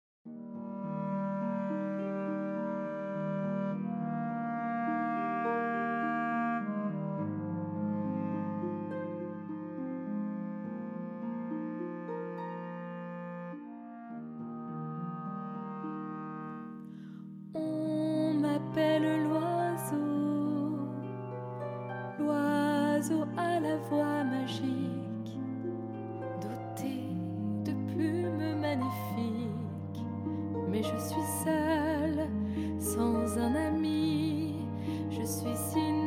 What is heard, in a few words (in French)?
L'Oiseau Musique chanson